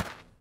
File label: dirt step; Golden Crayon Sounds